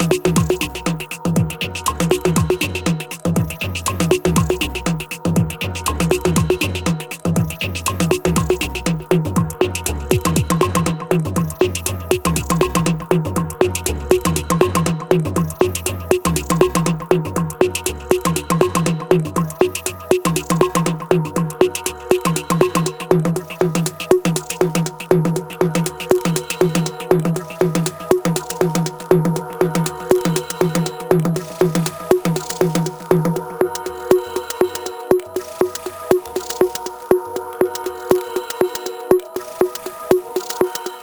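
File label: Dub-ish tribal house-ish… DT + TR-8s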